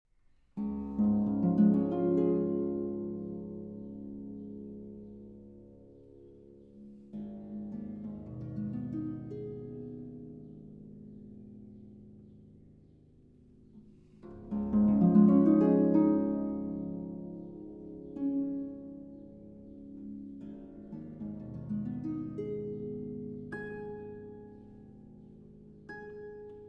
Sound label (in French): Harpe; Deux Impromptus Pour Harpe I. Lent